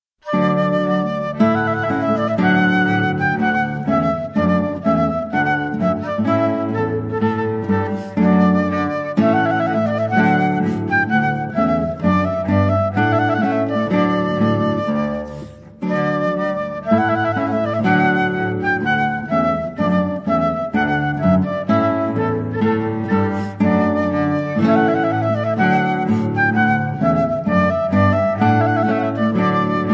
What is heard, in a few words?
Trumpet Voluntary Trio